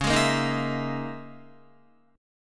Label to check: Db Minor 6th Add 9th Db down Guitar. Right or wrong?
Right